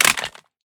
pistol drop tile; STALKER; IWP

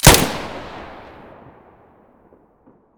muzzle stereo, weapons

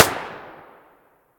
muzzle p99 mono distant, weapons

